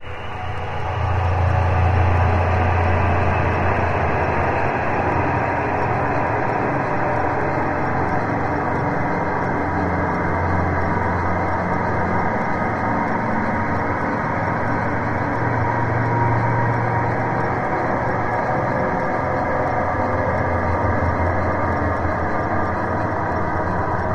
Scan Room mechanical scanning texture with gentle reverberate tones; granular